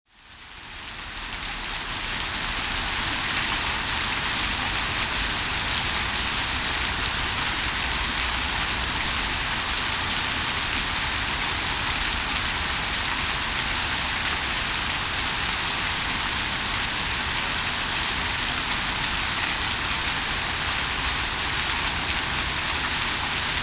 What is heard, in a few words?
water fountain, Vancouver, Canada